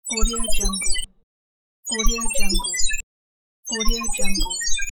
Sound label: digital text; pashastriker